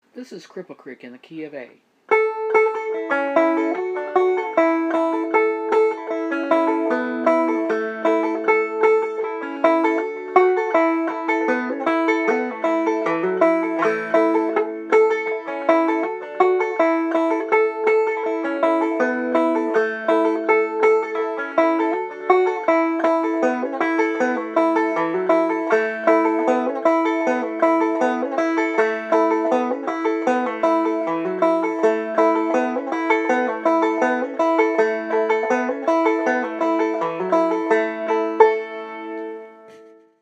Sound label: Cripple Creek Banjo key of A